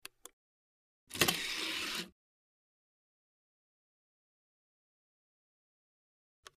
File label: Cd Rom Door Open Tray, computers